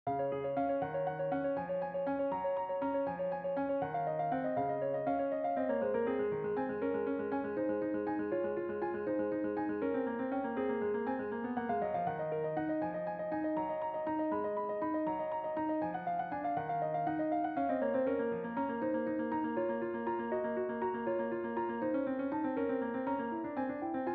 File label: California Keys